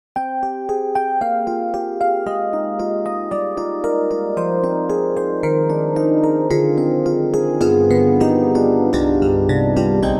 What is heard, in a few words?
EP Mix PCM Synth Tone; Roland INTEGRA-7